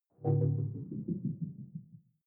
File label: sci-fi critical bell